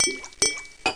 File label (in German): Glas